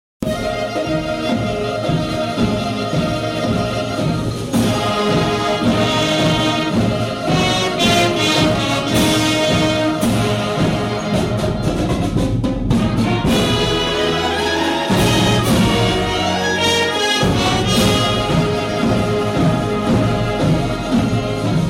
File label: Marching Fanfare